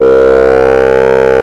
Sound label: bass hard; basses; lmms